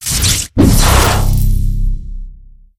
Birds Bomb Shockwave; Angry Birds

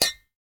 Saucepan Bounce; Boomerang Fu

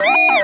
BASE ALARM

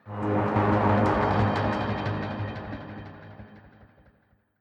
Stinger; Execsearch

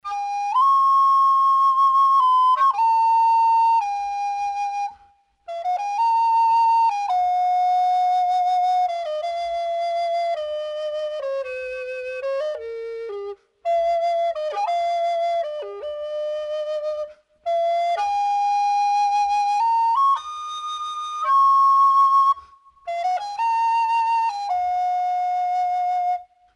Flute

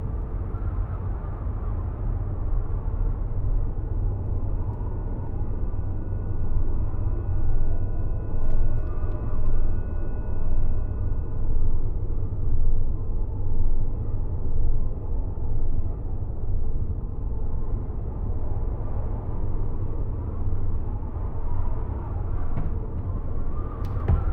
Ambient

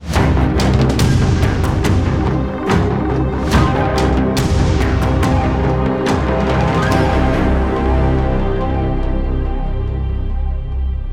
Stinger

